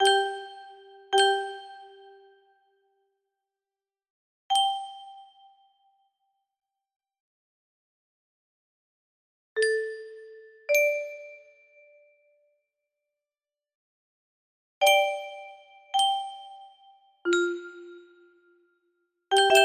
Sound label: melody